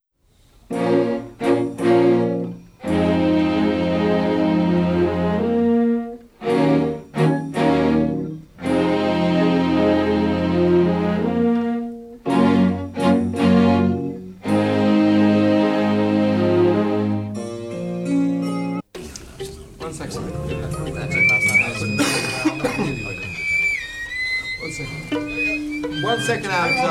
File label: orchestral; Strings